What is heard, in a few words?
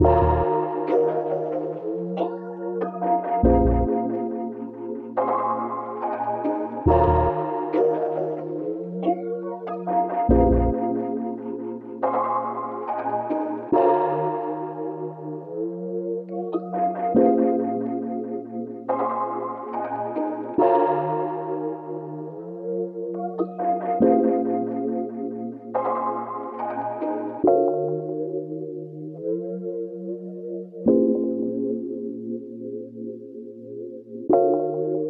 140 BPM A# Min, Melody Loops; Cymatics; Orchid Sample Pack